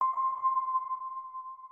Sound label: organ